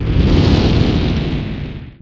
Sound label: flame whoosh; mechtower